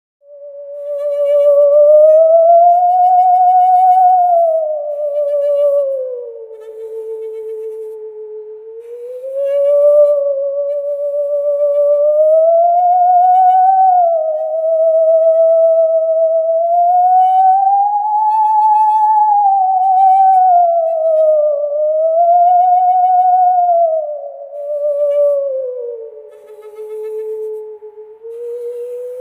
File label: saw